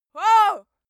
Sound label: female attack